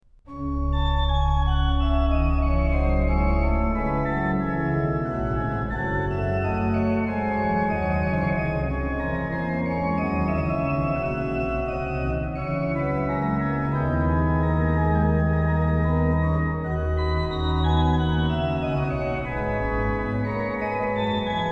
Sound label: Christum wir sollen loben schon, organ; BWV0611; BACH; BACH ORGAN WORKS; CHORALE PRELUDES